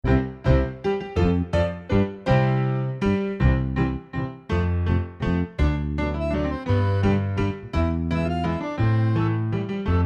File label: We Wish You a Merry Christmas SOLO PIANO